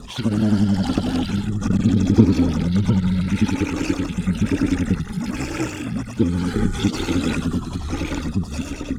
Crustcrag Sing

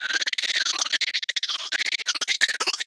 Shardillard Sing

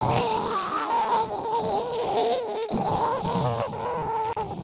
Furm Sing

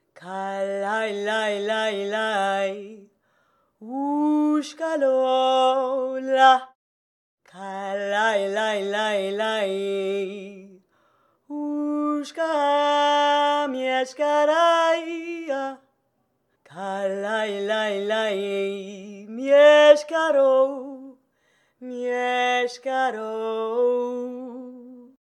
Shakra Sing

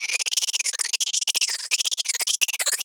Beastfly Sing

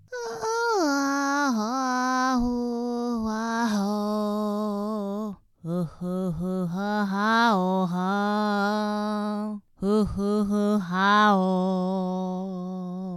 Sing; Smokerock Sifter

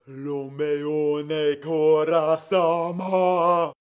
Grand Reed Sing

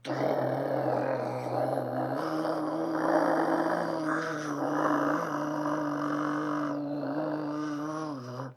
Sing; Skarrgard

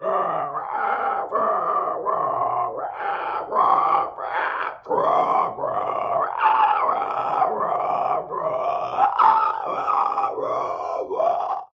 Craw Sing

